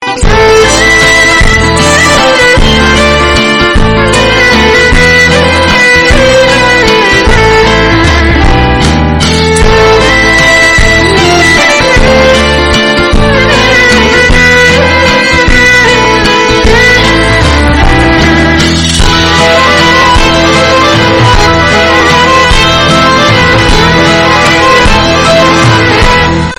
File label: violin bgm; 100 days of love